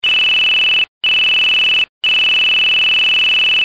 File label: Loud Beeps; LG enV2